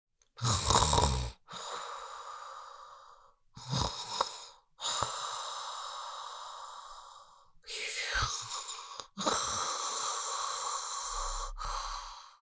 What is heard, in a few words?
Adult female sleeping snores and heavy breaths